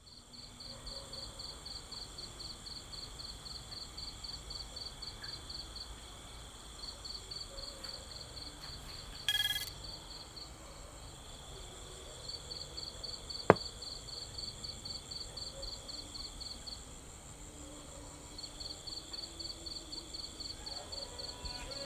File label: By the sea; Runny-Noise